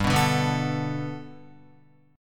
G Major Add 9th, Gadd9 chord, Guitar, 4F,4A,5C,5G,5C,6E; Gadd9 strummed